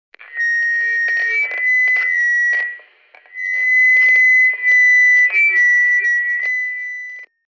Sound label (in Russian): mikrofon nachal fonit